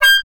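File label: oboe, wind; Miroslav Vitous - Solo Instruments VOL-2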